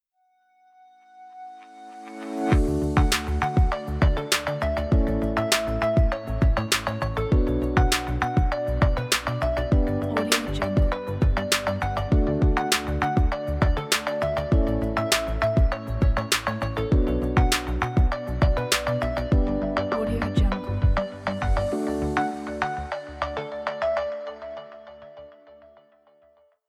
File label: audio logo